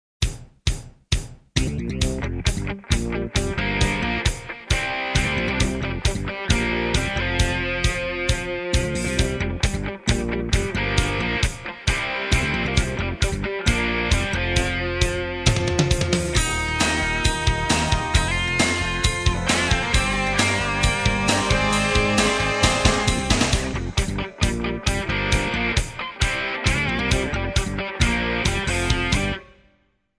片头音乐

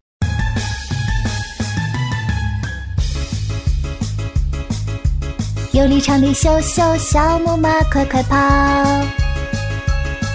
歌曲翻唱 童声唱歌

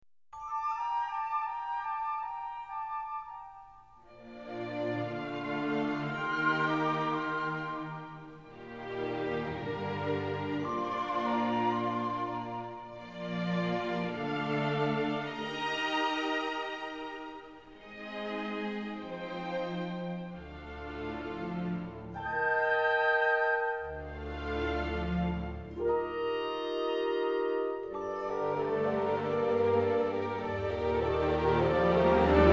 深沉的背景音乐

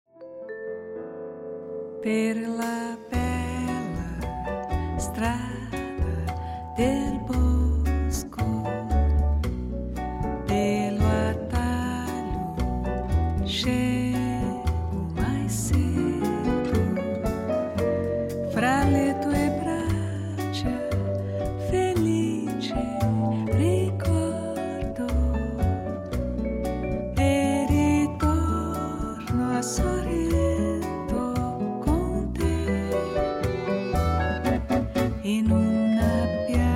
抒情的背景音乐

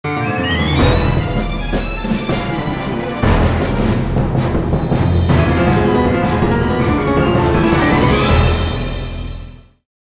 大气的背景音乐

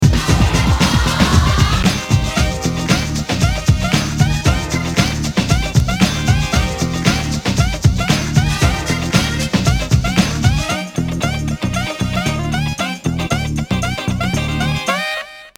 电视包装音乐

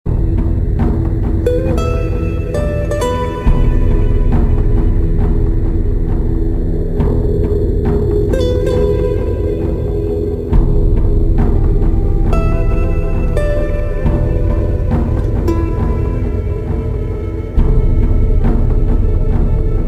专题片背景音乐

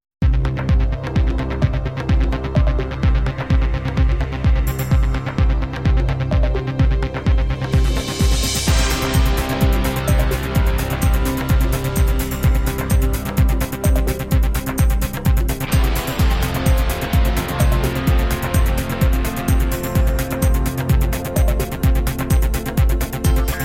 宣传片背景音乐; 3分以内

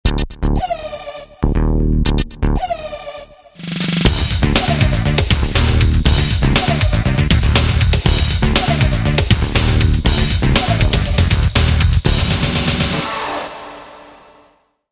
片头音乐

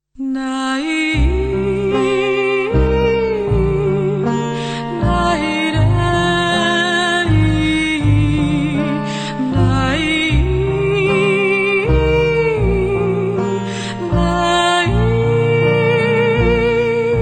伤感的背景音乐

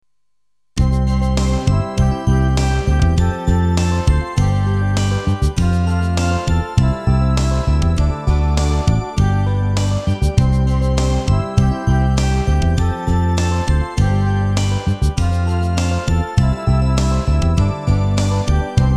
电视包装音乐

